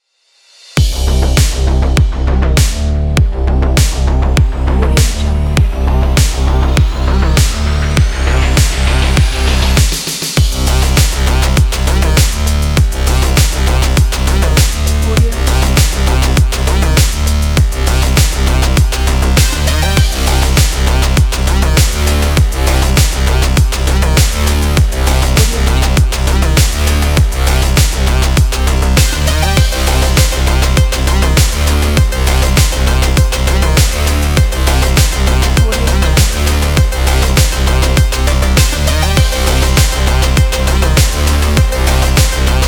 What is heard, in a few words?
cyberpunk electro